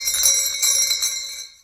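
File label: Jingle bells noisemaker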